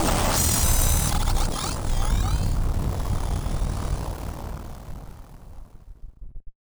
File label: Malfunction Synth, malfunctioning synth samples